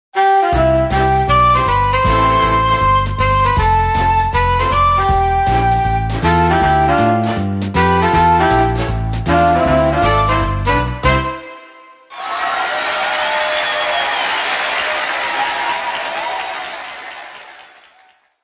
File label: Suisougaku Game Music; Bunkasai